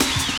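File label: snare drum, Drum & Bass, 160 BPM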